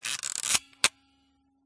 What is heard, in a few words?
smena symbol camera wind film lever; dpa4060; pmsfx